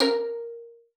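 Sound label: violin spiccato A#5; Xsample VOL-5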